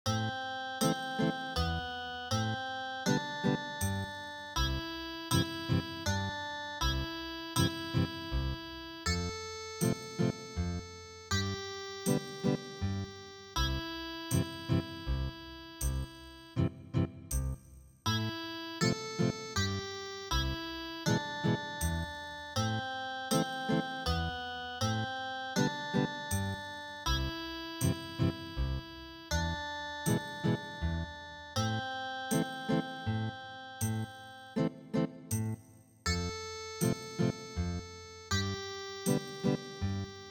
Ethnic Guitar

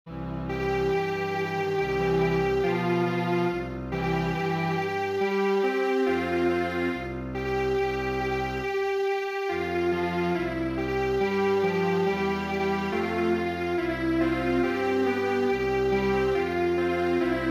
Strings Quartet

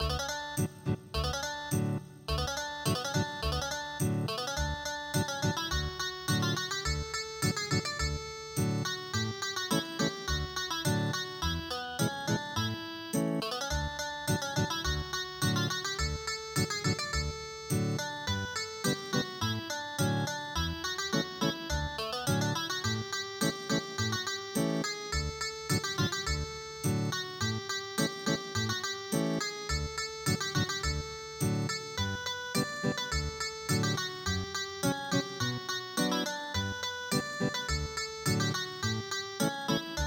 Ethnic Guitar

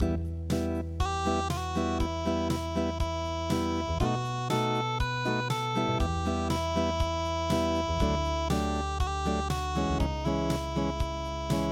unpluged

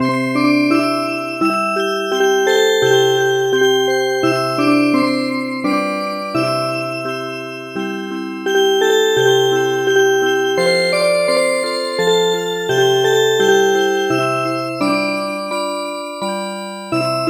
Music Box

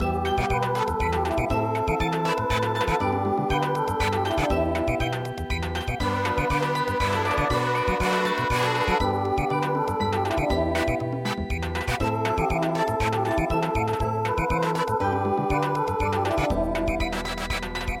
chonan samugori Samba

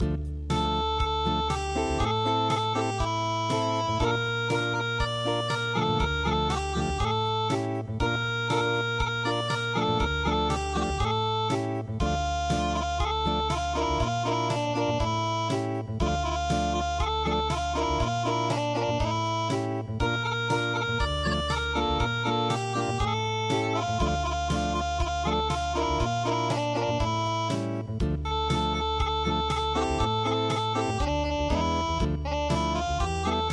touri unpluged

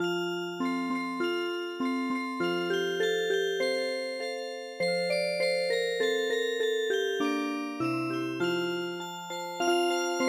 Toy March Music Box

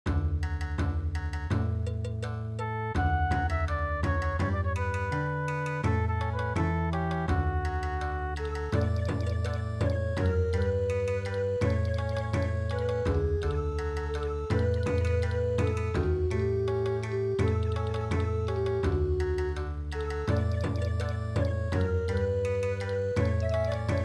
Bon Odori, midi

